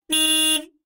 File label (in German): hupe